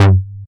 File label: Bass Shot G